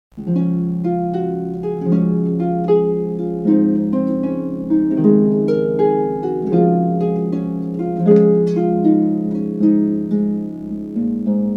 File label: Guitar Concerto in D Largo